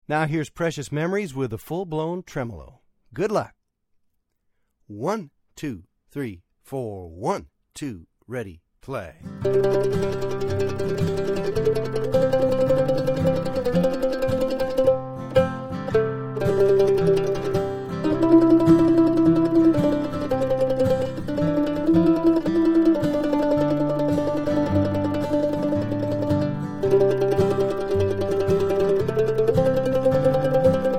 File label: Tremolo